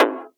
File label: hard bass drumz